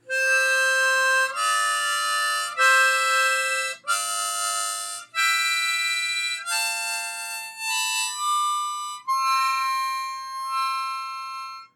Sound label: C Major Scale Busy, harp